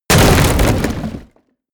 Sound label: break wood medium